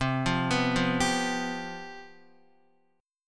C Major 7th Suspended 4th C down Guitar; arpeggiated

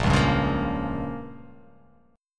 Bb Minor 6th, guitar; strummed; JGuitar

